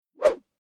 Whoosh Swish Sweep By Long Bamboo Cane Medium Pitch High Speed; Staff And Rod Sound Effects